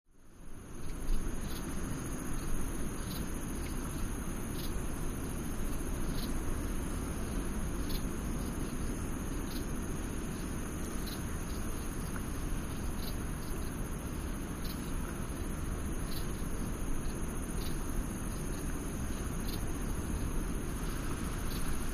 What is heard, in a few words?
Close Cicadas, cicadas